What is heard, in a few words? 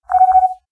intercom, technology federation